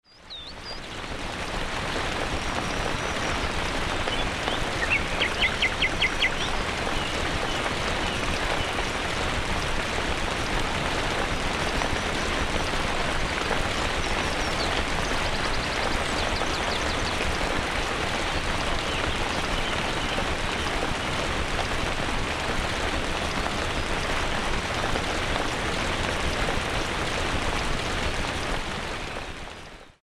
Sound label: Forest Downpour